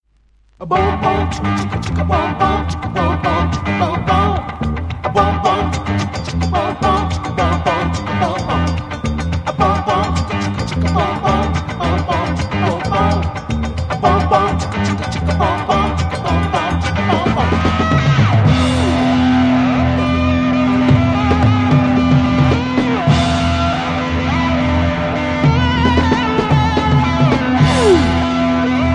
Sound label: anvil chorus rhythm